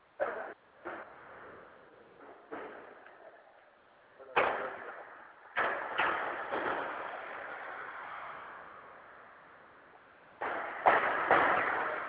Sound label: GUNSHOTS; Message Mystиre; call fire emergency; Anti Jingles et Curiosites